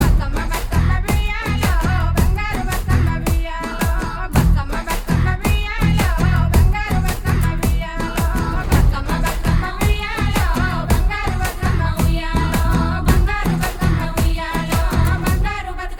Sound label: Song Ringtone